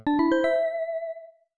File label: success, magic